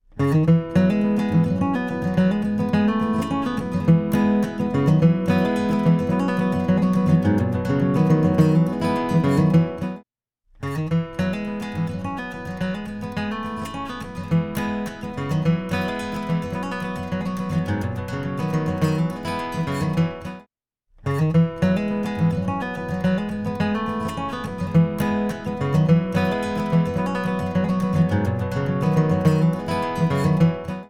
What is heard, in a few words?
eq flat wide narrow